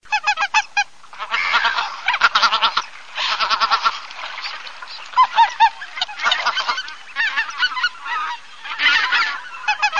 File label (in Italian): OCA LOMBARDELLA